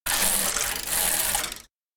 row lose rhythm; anemoi

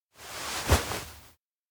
chop gesture; anemoi

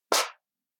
hands pray; anemoi パッケージ版 1.1

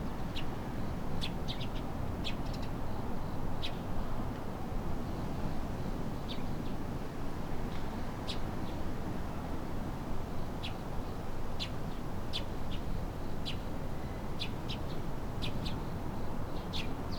outdoor town

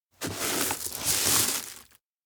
sketchbook pick trash; anemoi

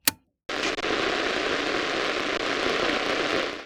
radio switch on; anemoi

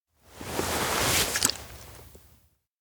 take sprayer; anemoi

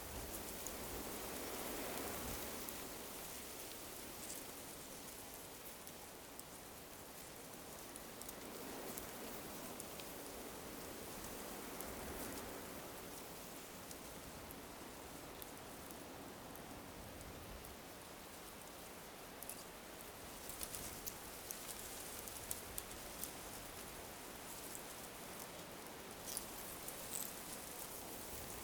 outdoor calm wind; anemoi

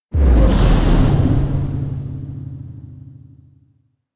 elec windcorridor escape; anemoi

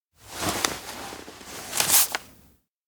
bag takeout note; anemoi

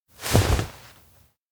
hand offer; rikka; anemoi パッケージ版 1.1

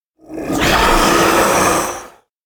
gake beast roar; anemoi

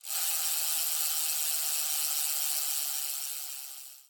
Relaxed ride; anemoi

